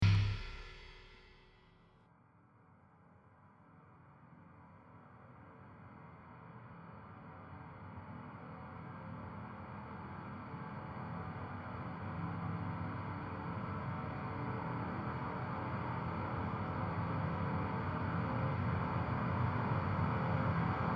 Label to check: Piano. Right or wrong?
Right